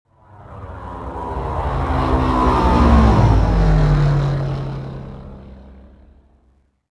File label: traffic by